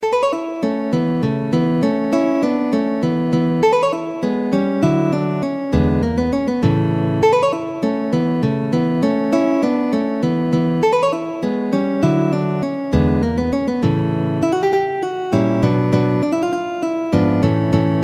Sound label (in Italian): valses, guitar